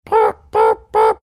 attempting to make sound mario luigi going down pipe